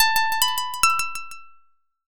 koto

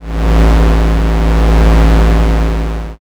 space engine

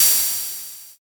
cymbal

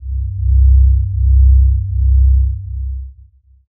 space drone

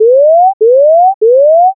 scan

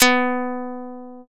shamisen

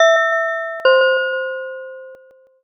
pinpon bell

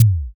kick

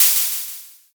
open hat

